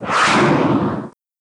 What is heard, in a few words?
missile fire